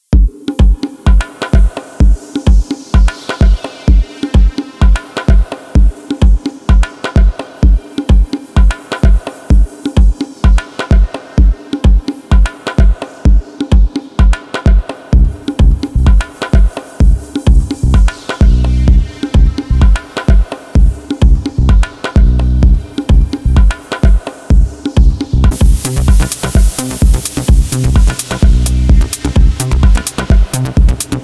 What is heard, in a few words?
Glockenspiel